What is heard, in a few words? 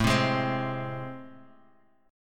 A Minor Major 7th, down, Guitar, Half Step Down